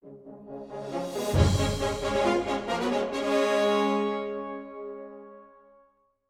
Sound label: Music Cutscene Ingame; asterix obelix slap them all 2